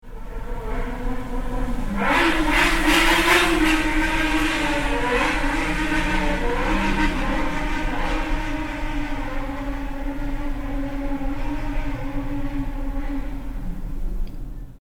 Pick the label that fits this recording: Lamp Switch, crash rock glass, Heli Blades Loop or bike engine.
bike engine